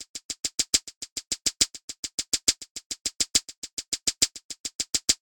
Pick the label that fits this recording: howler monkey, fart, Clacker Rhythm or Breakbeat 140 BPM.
Clacker Rhythm